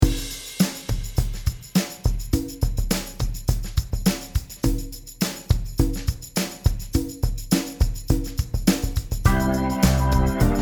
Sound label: Solo